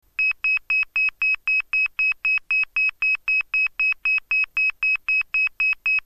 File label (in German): ton, Signalgeraete